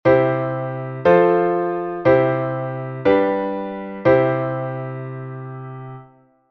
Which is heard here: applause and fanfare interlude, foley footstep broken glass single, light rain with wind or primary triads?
primary triads